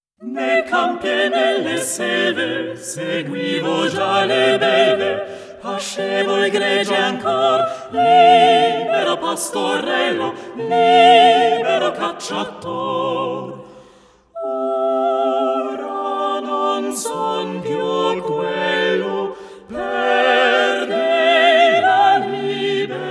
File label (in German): Mehrstimmige italienische Gesange; ''Nei campi e nelle selve I''; Der Glorreiche Augenblick Op.136; WoO99